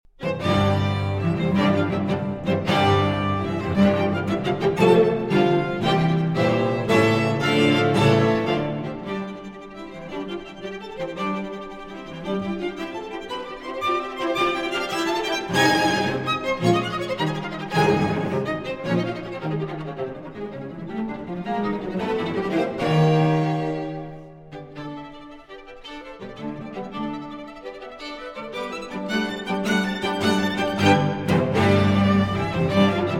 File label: String Quintet in C Major, Scherzo. Presto - Trio. Andante Sostenuto; D.956